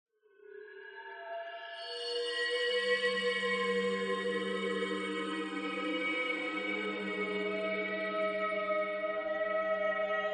ambience sound design shriek screech moan wail metallic reverb haunted eerie; skyclad sound